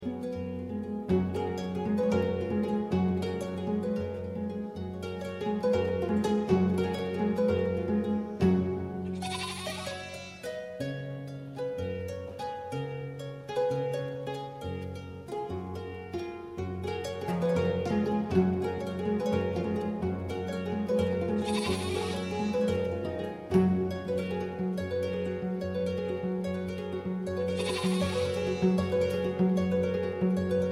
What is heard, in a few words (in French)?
belements d agneau au senegal avec kora